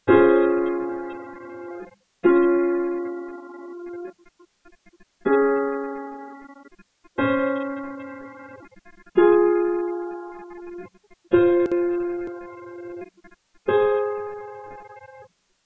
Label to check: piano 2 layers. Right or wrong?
Right